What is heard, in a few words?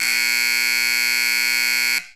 Buzzer